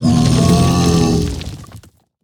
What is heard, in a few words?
Stone Golem Death